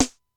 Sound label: Simmons SDS-9, Drum Machines